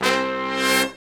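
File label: brass shorts, Brass Sections, Horns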